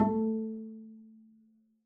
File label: pizz a3, double bass; dsmolken